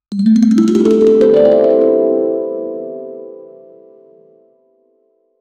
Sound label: soft steel drum, major chord